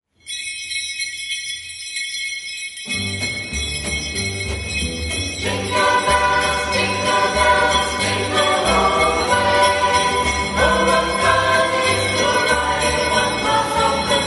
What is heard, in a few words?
Jingle Bells